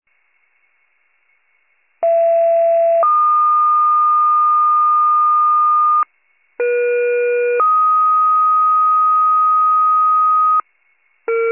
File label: fire, pager alert